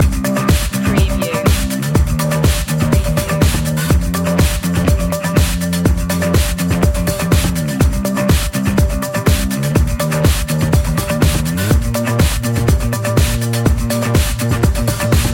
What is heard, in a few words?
streamliner loop